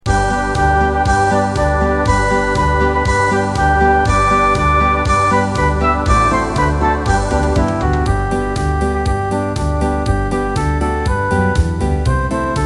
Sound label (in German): zirkuslieder playback